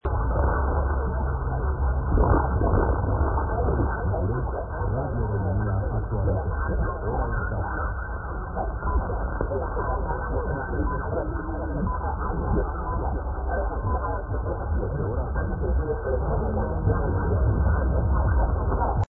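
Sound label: Romania, radio; R Actualitati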